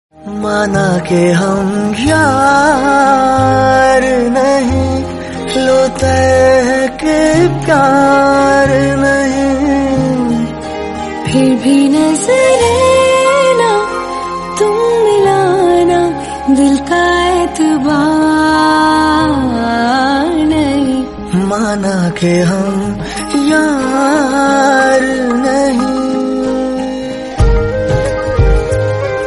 MALE VERSION RINGTONE

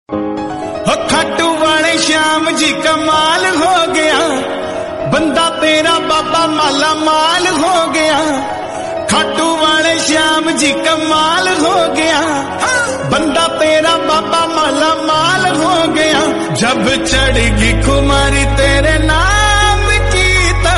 BHAKTI DEVOTIONAL HINDI RINGTONE

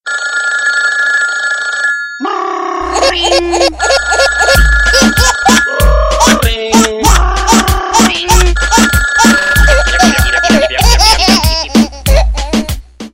YIP YIP RING BABY LAUGH RINGTONE

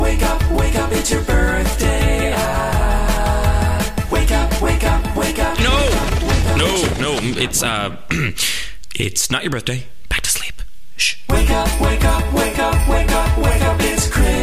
WAKE UP SONG ALARM RINGTONE

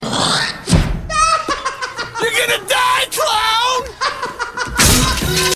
clown laughing ringtone

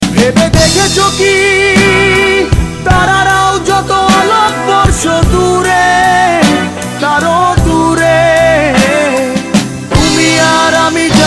BENGALI SONG RINGTONE

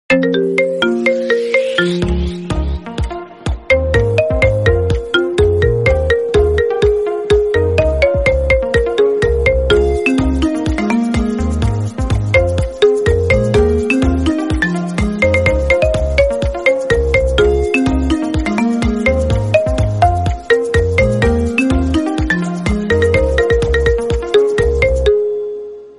IPHONE TONE REMIX RINGTONE

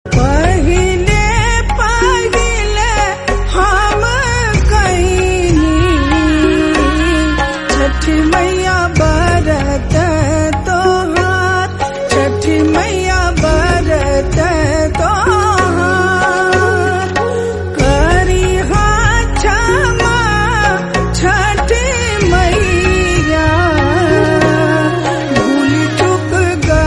CHHATH PUJA SONG

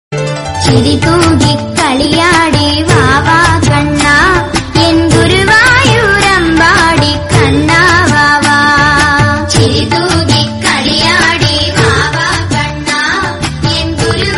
KRISHNA SONGS TAMIL RINGTONE

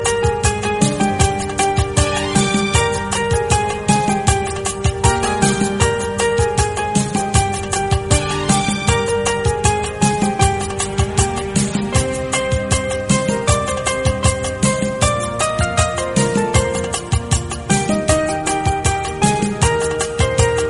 INSTRUMENTAL RINGTONE

